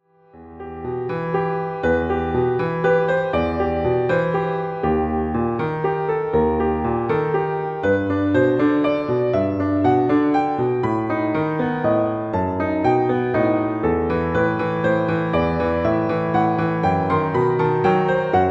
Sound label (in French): SUITE EN MI MINEUR POUR PIANO